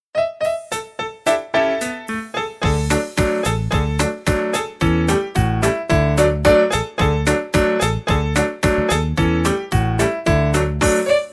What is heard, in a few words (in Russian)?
igra Najdi sebe paru